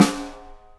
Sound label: snare ring; Greytsounds VOL-1